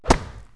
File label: whack; Wired for Sound Pro CD; Aristosoft, Inc.